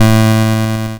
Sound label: NOTE bass A3, LSDJ; LIMAT LSDJ kit